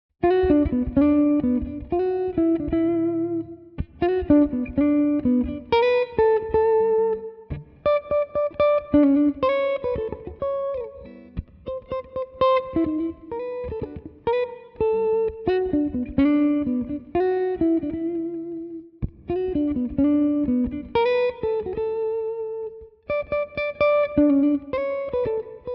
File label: melody